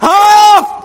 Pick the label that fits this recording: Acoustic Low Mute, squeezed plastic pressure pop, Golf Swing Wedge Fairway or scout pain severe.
scout pain severe